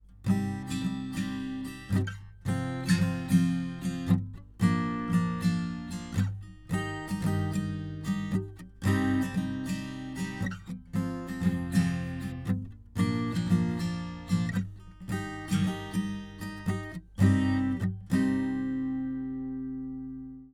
Akkord Cm uprazhnenie

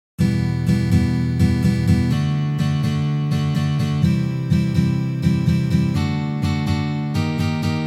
boj

